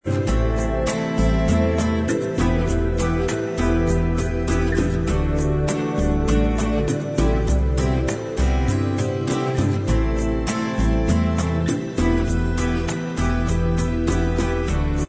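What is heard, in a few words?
bossa style, Instrumental Track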